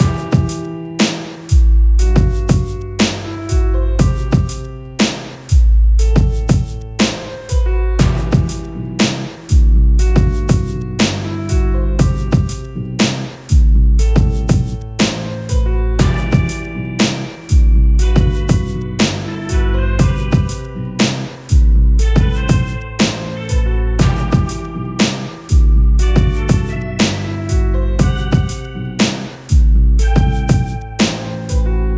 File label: Inspiring Dramatic Beat